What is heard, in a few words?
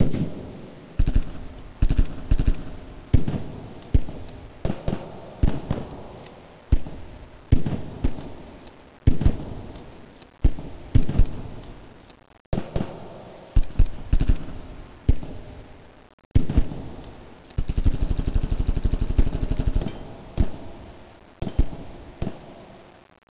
ambient guns; de recoil cz